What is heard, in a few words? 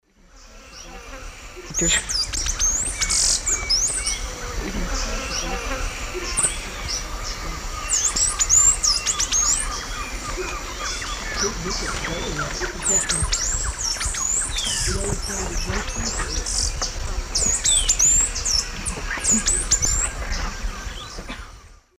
Parkerthraustes humeralis, song; S. America; Eastern Lowlands